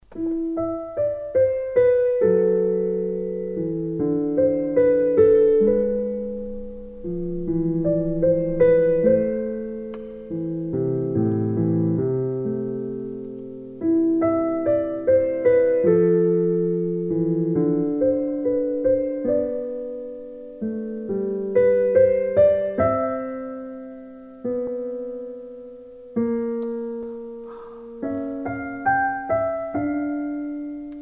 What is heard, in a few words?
peaceful in a storm piano